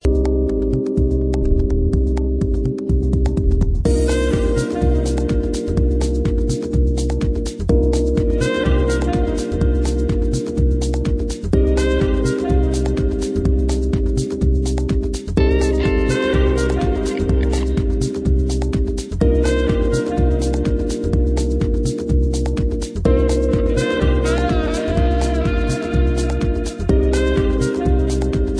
romantic

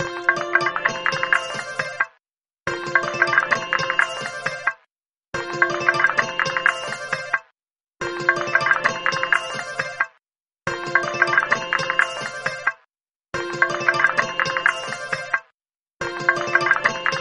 bollywood ringtone

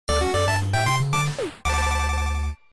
8 bit; mario odyssey

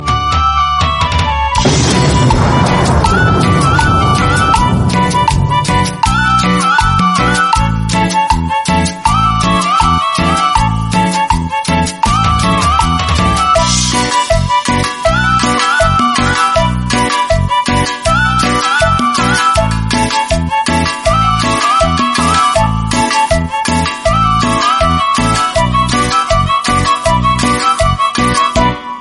fiesta

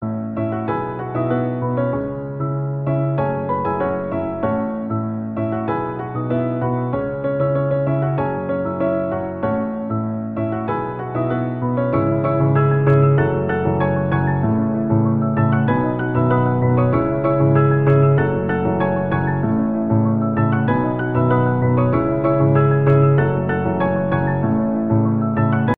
piano

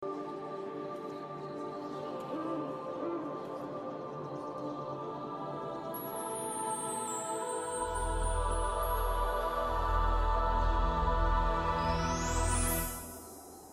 dolby digital 5 1 temple

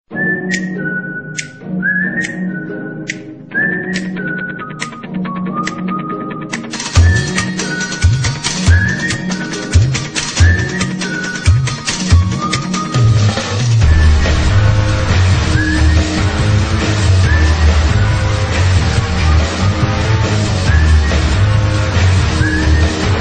castle theme music

